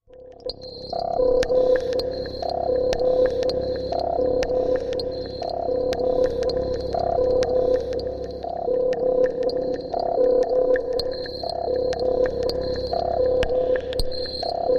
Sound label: Space Clock Machine, scanners